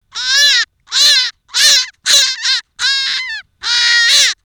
nyul; vadhivosip

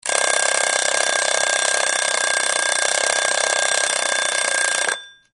effekt vekker

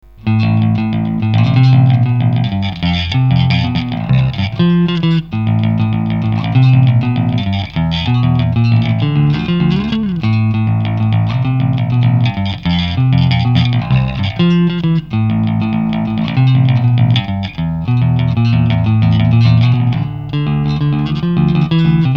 bass felso, basszusgitar; Handmade Guitars and Basses

